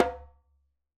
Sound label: djembe Single Hit Right Hand; Raw Perc One Shots; Slate; SD SEISMIC Drum Sample Pack